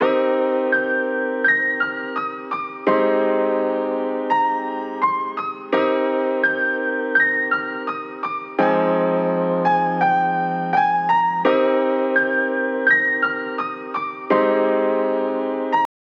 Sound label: Keys, Cm, 84 BPM